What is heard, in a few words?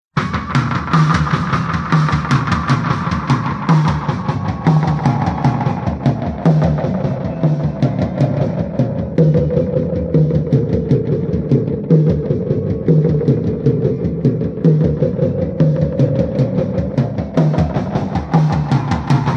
sub beat